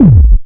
Roland MC-202

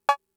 Cowbell; Fricke MFB-522

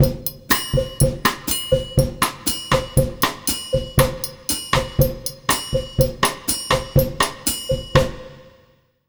120, Brazil Bossa; USB Soundscan; AKAI

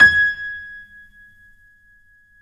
G#5, CONCERT PF; AKAI S-Series CD-ROM Sound Library VOL-7

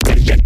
E Growl Bass; neuro; BIG5AUDIO

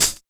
D.HAT L; East West - Phat & Phunky CD2; 90's Sample CDs

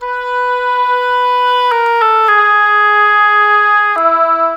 Cinematic Oboes; Sonic Foundry (Sony Creative Software); Orchestral Series VOL-3 - Cinematic

